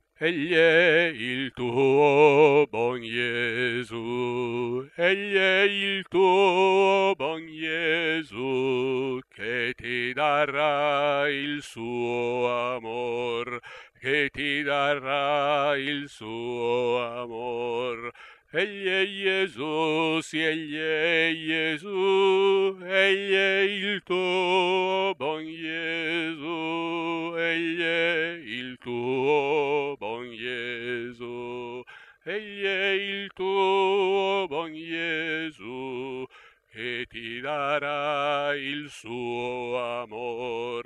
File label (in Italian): Contralti; Egl e il tuo bon Jesu; Passio